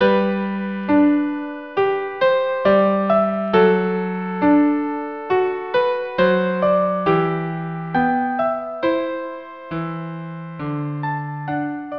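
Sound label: arioso violin viola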